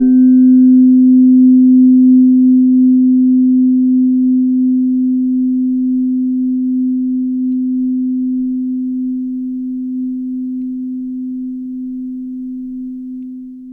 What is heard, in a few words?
chime Low; Sedna